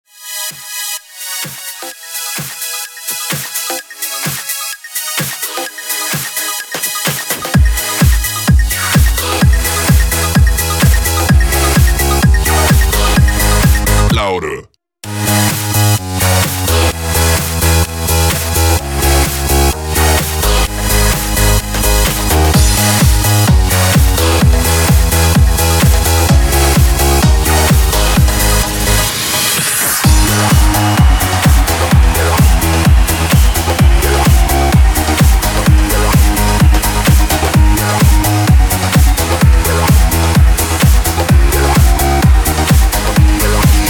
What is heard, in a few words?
Dance Deluxe